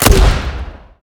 fire, 40mm; gmod; arccw uc commons attachments

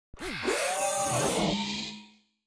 dodge; tridroidznnpc

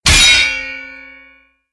impact heavy; tridroidznnpc

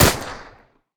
fire; gmod; arccw uc commons attachments

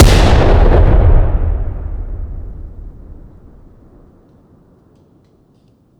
explosion close; gmod; arccw uc commons attachments

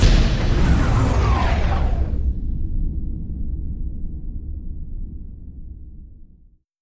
hyperspace stop; gmod; star wars battlefront 2 galaxy map; hyperspacecollection

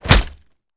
punch gun player; gmod; arccw uc commons attachments

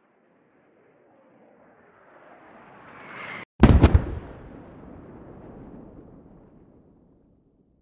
mortar impact smoke 100m; gmod; better mortar

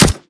common mech heavy; gmod; arccw uc commons attachments

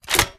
203 open, 40mm; gmod; arccw uc commons attachments

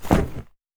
sledge shove; melee weapons; tfa starwars melee weapons pack

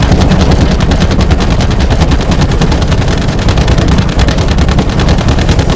mc loop interior; planes; gmod; lvs planes; weapons

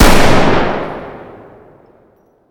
fire dist int shotgun; gmod; arccw uc commons attachments

